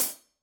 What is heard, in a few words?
14 heavy hi-hat closed; signature precision